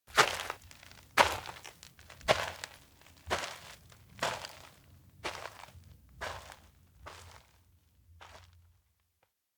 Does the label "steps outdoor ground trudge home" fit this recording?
Yes